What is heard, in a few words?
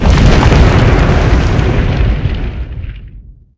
quake; dn3d r